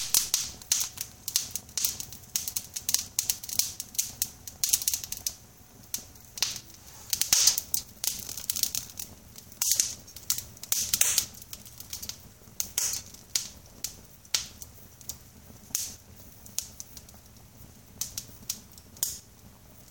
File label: crackling fire